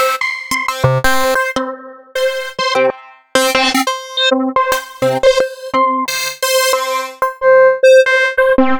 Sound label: Synth Hits